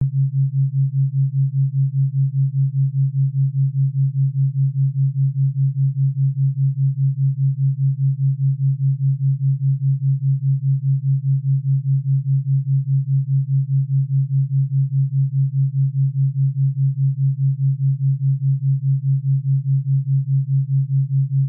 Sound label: mid theta, binaural beats